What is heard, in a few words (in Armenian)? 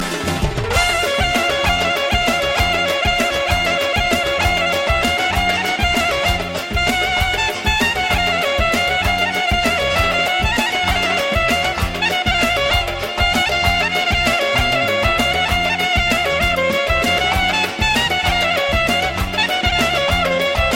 Rabiz Haykakan